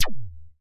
laser, lasers

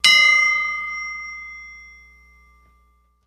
Ding Metallic Hit, chimes & bells; Ding, metallic hit, funny wobbly

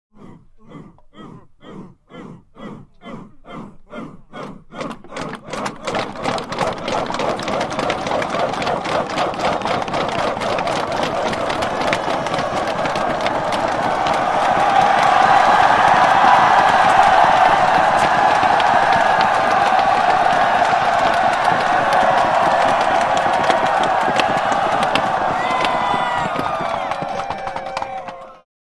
Infantry Zulu Chant, infantry cheers

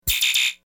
Computer Warning Bee, beeps & tones

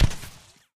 flak canon impact, futuristic

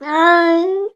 Cat Domestic Meow Sad

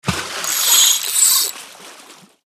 Fish Reel Strike Line, fishing

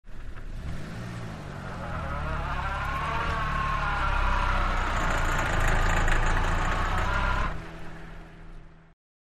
Car Reverse Whine; car various

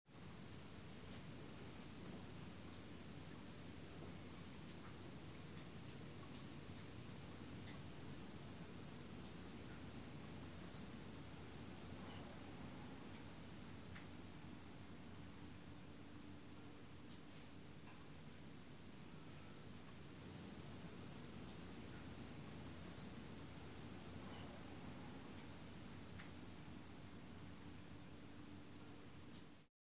Small Room With No A / C Very Light Movement.

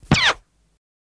Machine Gun Silenced, silenced bursts

